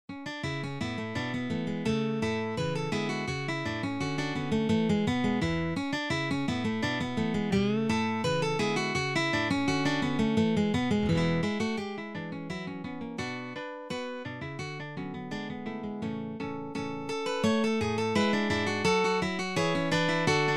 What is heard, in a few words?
hornpipe; hook james